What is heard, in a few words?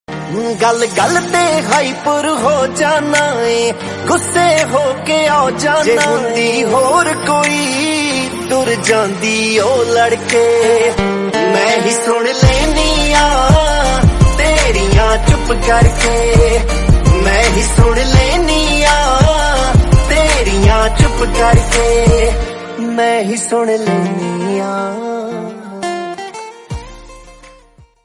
hyper Punjabi love song tone ringtone; nokia ringtones